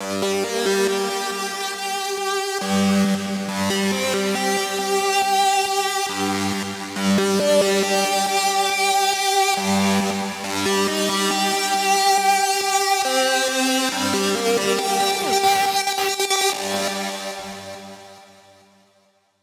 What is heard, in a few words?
138BPM Mainlead Intro WET, Gminor; Unlooped